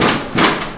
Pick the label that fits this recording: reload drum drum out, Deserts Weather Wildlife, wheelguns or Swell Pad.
wheelguns